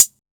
Hat Closed, 606; classic machines

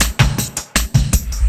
LOOP SD, 160 BPM, Drum & Bass; Ueberschall; Drum & Bass Flash Trackz

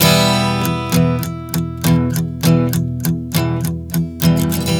Strum 100 Em, One Chord Strumming 100 bpm

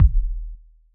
hip hop kit kick

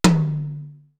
TOM 2, RY30 drums; East West; Global Sounds

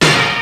DRUM STUFF, ENSONIQ VFX; Rubber Chicken Software Sample Bank

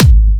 Trance Kicks, Bassdrums

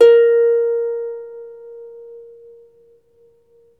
Troubador Harp

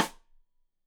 Side Stick Stereo; Deeply Sampled Drums; SMDrum

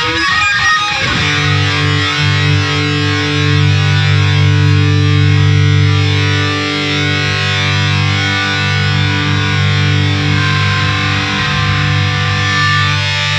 SPACE GTR; Zero G - Funk Guitar

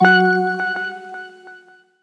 synth note bell thing F#; Virtual Riot; Synth Notes & Chords

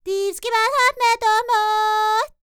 Vocal Planet World Voices, NOMADIC

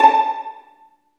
String Vlns Pizz; Roland - String Master Series; Vls Pizz

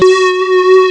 mini gong; ST-XX Amiga Soundtracker Sample Packs

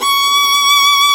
STR SOLO V4Y, Roland LA Composer strings; Roland - LA Composer vol. 1

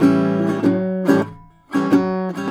Blues Guitar Samples, riff

